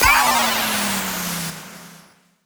lose

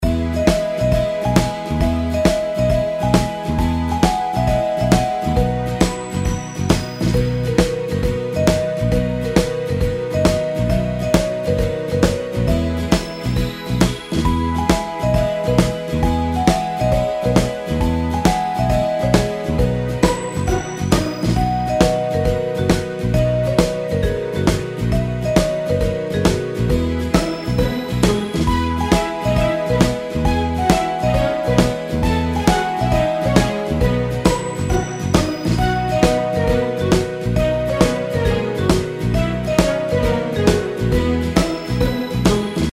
reelspin bg; Jelly Mania

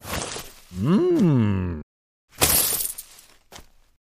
appear; robin hood; Sheriff Of Nottingham

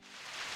gambler wait; Hot Party Deluxe; Wazdan

